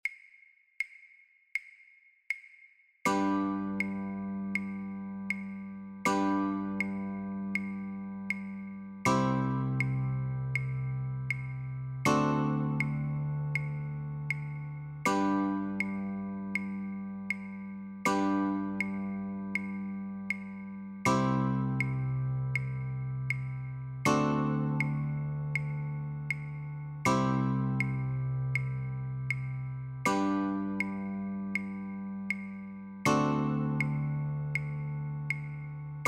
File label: Chords, guitar; Up on the Housetop; christmas